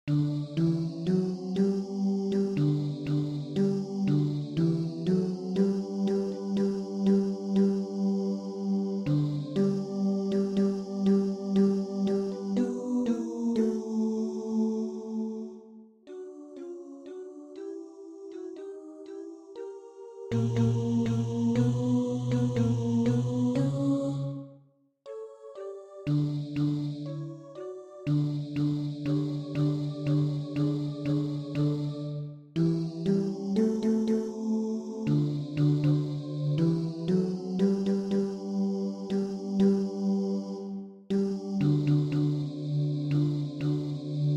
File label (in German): bariton